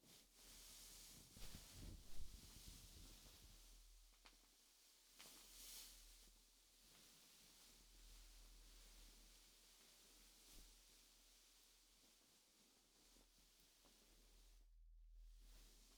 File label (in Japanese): 布団 を もぞもぞ; 3DIO; 布団の音